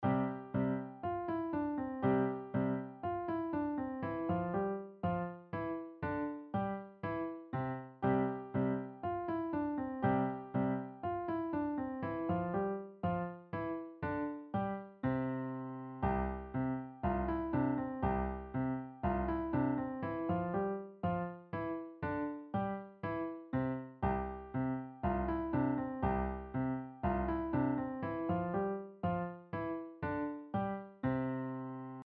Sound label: musette